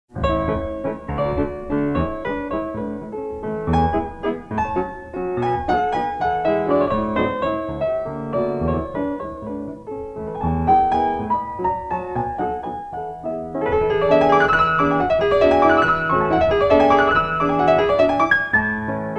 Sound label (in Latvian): valsis; f sopens; op 34 nr 1-2